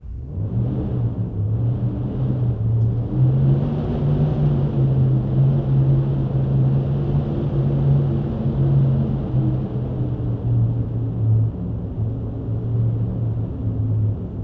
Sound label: Wind Gap Indoors